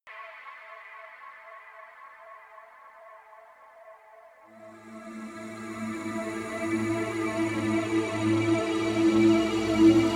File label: dark phaser, pads; flaechen